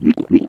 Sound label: drink; minecraft